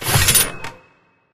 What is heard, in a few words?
button down; video games; buttons; source engine; portal2